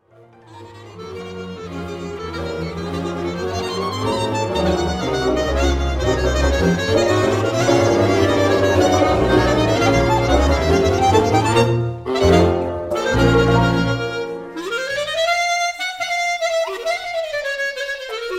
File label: clarinet solo